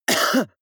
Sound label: cough male single; koerpergeraeusche